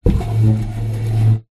household chair dining rubber feet drag slide wooden floor